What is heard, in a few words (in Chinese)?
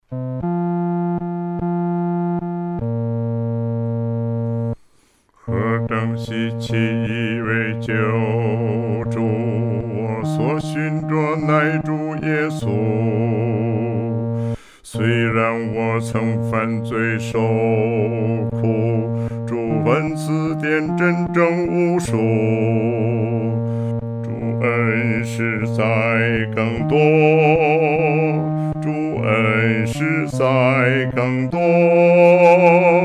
独唱 第四声